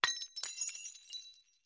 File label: Glass Breaks Distant; windows & mirrors